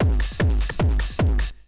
Bite Size Beats

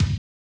muffled bass, Percussion; Giga Samples Collection; EMUSP12

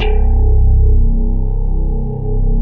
Dance Floor Bass, Multisample Basses

